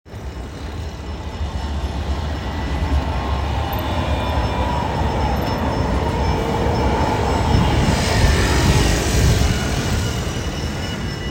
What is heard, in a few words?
City street crossing, Rotterdam Field Recordings